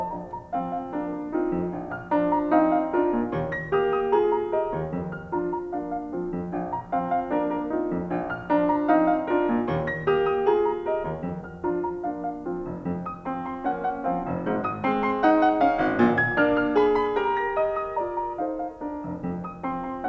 Variations and Fugue on a Theme of Handel, for piano, in B flat major; Brahms